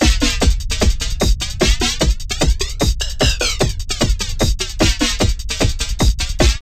FLANGER, Tekkno LOOPS 150; Masterbits - Tekkno Trax

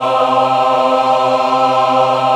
C3 MW AH, Choir; USB Soundscan vol.28; Acoustic & Synth; AKAI

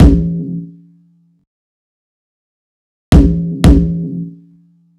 Kick, Kick Loops; KJ Sawka - Drum n Bass & Beyond; Drum Tool Box

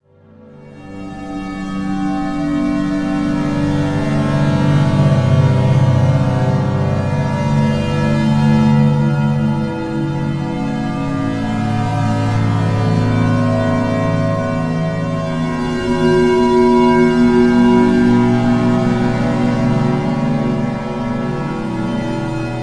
Headswim, Harmonic Atmoshperic

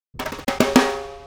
snare fill; Drums; Snare Patterns; Essential Percussion

